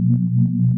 Sci-fi Hover Bot Loop; SFX Kit; Sci-Fi Loops

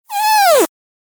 Fart Foley Strain, Thin And Windy

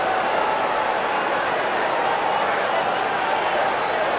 Football Crowd Loop; Crowds

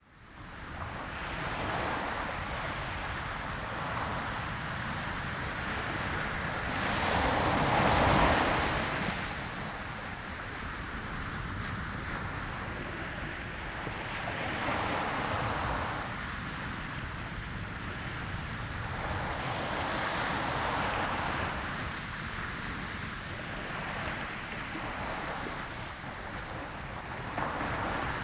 sea sandy beach; best service Blue Box; sea ocean

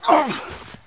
Male Hit By Pitch Baseball

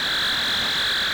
Projectile Flight Loop; Weapons

